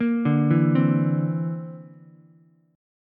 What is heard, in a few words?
D 7th Suspended 2nd Sharp 5th, Ukulele; D7sus2#5 arpeggiated